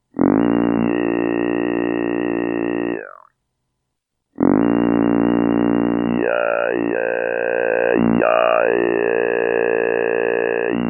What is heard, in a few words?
bass